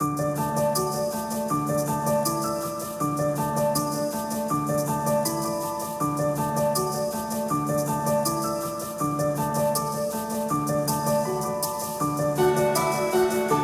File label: bgm story happy; sword of the necromancer